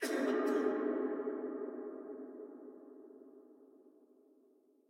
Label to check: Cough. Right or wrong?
Right